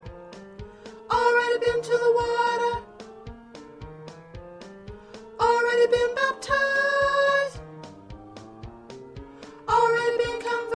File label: Soprano